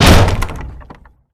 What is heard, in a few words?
bang, Doors; Cosmic Rage; The Experience-light